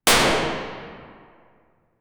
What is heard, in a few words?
sound effect of a powerfu, shotgun